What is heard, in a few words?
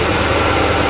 run